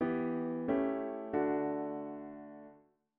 diat V7 inv

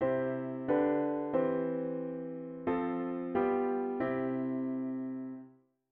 chord prog chunks diat inv and V7

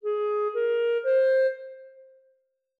5 notes F min

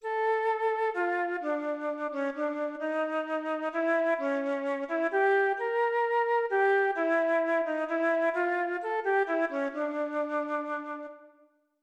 Mel dict chromatic long

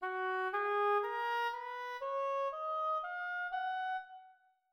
major Gb4

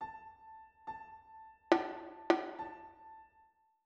rhythm compound 2 beat

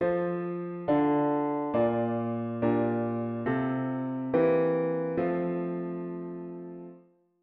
diat inv V7 inv bass

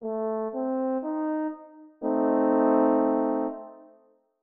triad dim A3

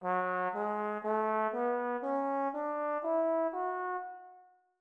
nat minor F sharp 3

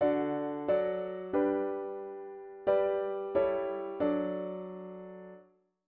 chord prog chunks diat inv and V7 inv

